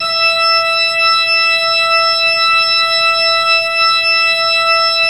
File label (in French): Orgue Eglise Full E6, Pipe Organ Full